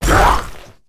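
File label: ghost damage, monster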